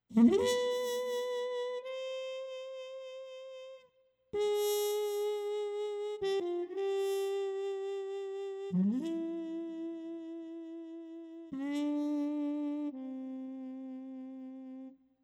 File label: Tyros5 Super Articulation Trumpet